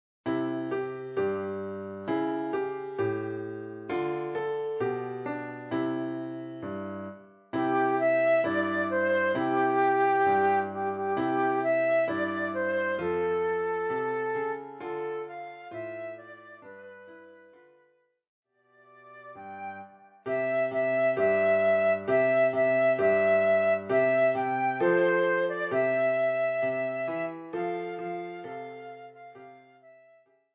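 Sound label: flute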